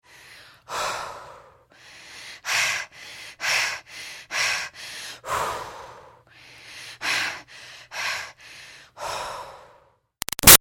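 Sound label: Human Female Breaths Exercise Steady-Slow; Breathing; Big Room Sound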